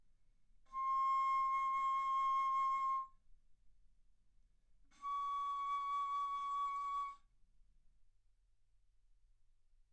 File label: Bass Flute C6 Db6; ff